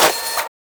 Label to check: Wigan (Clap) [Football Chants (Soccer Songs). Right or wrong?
Wrong